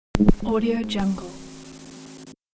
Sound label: analog noise